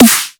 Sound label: Snare